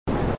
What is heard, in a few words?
Jet Loop; reel gold